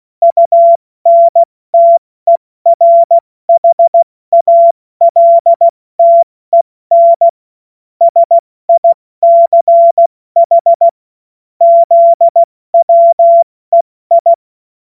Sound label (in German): Witz, cw morsen; Tempo 70 F80